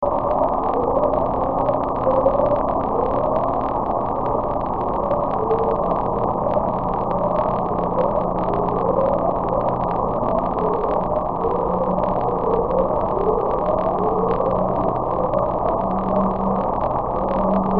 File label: upsweep